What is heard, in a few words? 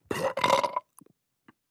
Airy Gag Belch